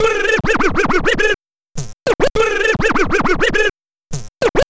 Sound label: Scratch